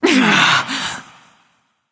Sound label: surprise; Smite; athena